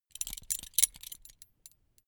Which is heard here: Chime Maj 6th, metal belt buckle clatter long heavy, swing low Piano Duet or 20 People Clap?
metal belt buckle clatter long heavy